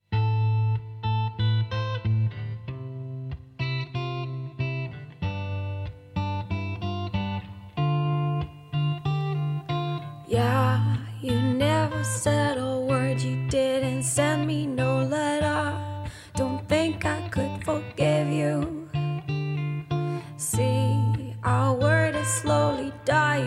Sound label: Ringtone